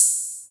Quasimidi 309, Drums

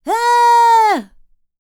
Vocal Planet World Voices, NOMADIC

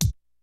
KICK SNARE; Kid Nepro; Elite; KICKS SNARES

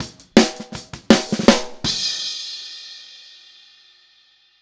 Outro Snare, 162 BPM, Drums; Drums from the Big Room The Mixes; Take Your Toms Off

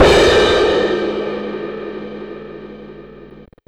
crash L; Zero-G; Chemical Beats; CRASH ZOO

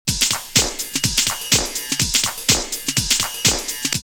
Break 124, drumloops Techno House; X-Static Goldmine

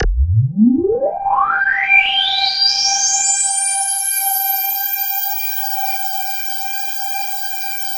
KORG DW8000, Vintage Keyboards

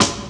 Snare, Percussion Hits; Zero-G Jungle Warfare 1, 2 & 3

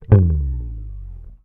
HOFNER, Bass

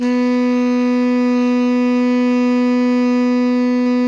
TENOR PP B3, Sax; Greytsounds; Philip Wolfe David Crozier's Saxophones; TENOR SWITCH

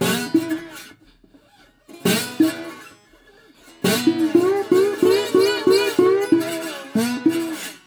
120, Blues Guitar; ILIO - Fretworks

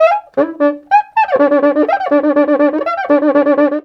Rio Batucada, 120

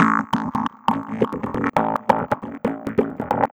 Acid Squelch, Bass

